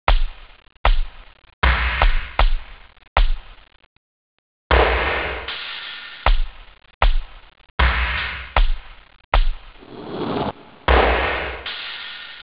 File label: joy beat; OctaMED SoundStudio V1